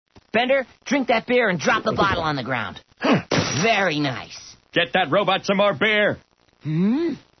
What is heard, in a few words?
beer; Beeendeeeer kriegt gratis Bier ...!